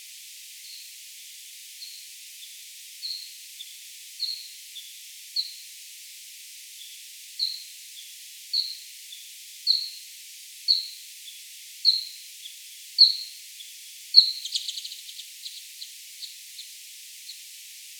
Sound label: B barbastellus; Creuse; S-Giosa